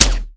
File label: uzi fire; firearms